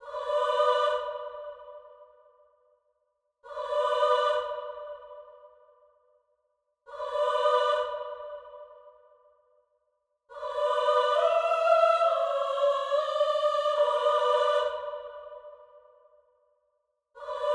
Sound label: 140 BPM B Min Choir, Drill; Melody; Loop Stems; Cymatics; 2022 Melody Collection; Sacrifice